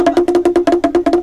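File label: ZAIRE RHYTHM; Crypton - DrumBase5000; AFRICNRHYTHM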